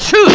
shoot

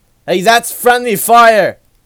shot

